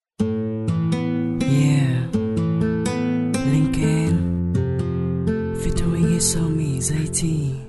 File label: rap; viet rap